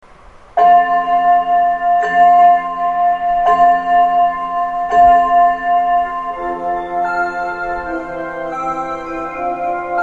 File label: hakodate dep melody